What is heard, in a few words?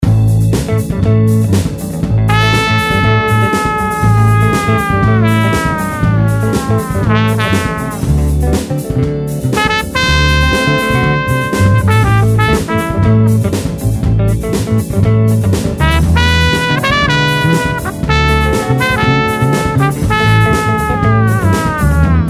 Blues in A 120 Solo Trumpet; Blues Solos